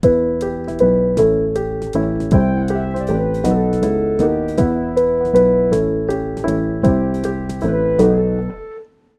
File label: triangle